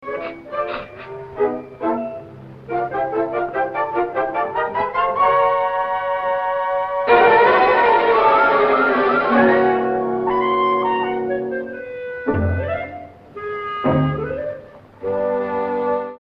violins

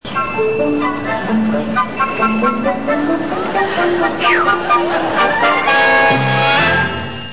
music

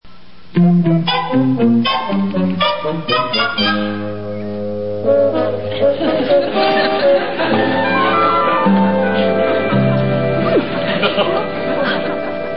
music

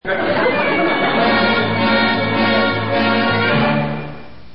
music

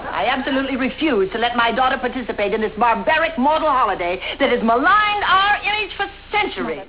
barbaric; halloween

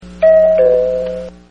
doorbell

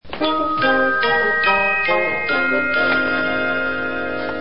music

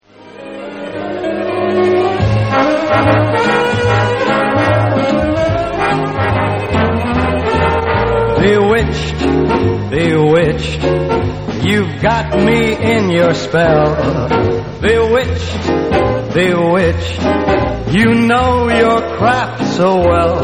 Theme Vocals

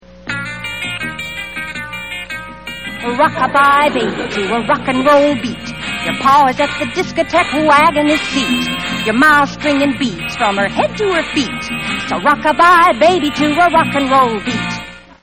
Rock a Bye Baby

